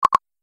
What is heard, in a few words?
Button Select